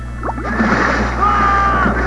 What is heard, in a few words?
scream